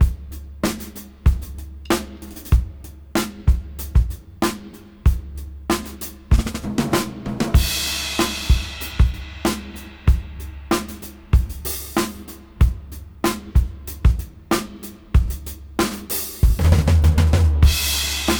Funk 94 BPM; AMG - Keith LeBlanc Phat Butt Jams

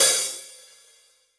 sfx perc

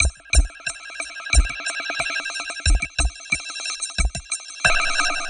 FAST SHIMMER LOOP

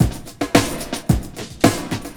Basic, beats; Sonic Foundry (Sony Creative Software); Ugly Remnants VOL-2

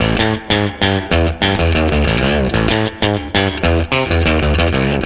Guitars, 95bpm, A1, analogue circuit; musicradar

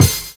MANDOLIN; Univers Sons - Kitch 70s

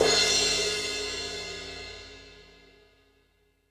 Metal Drums, E-MU Pro-Cussion

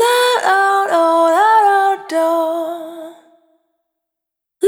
Vapor, Single Voice Melodic Loops; Pocket Diva World Class Vocal Samples

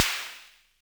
Snare, Univox SR-95

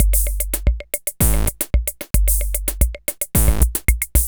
Beat, Drums & Percussion; Electrocution; Beats

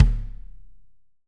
AMB KICK; ST AMB KICK

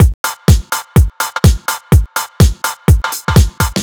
Electro Haus No Loop, Drum Loops 125bpm; Nu Dance Electro

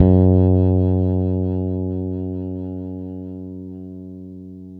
BRITE FRETLS F#3, Bass; Spectrasonics; Bass Legends CD 1

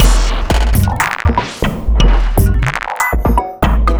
Wood Bloc Party, Drum Loop; Sonic Foundry; The Electronic Music Manuscript Collection; Drum Loop Structures

